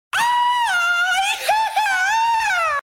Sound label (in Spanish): el grito